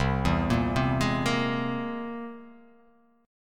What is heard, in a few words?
C Augmented 7th; arpeggiated; JGuitar